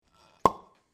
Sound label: Cork Pop, Cork Pop - Opening A Bottle; Corks; Bars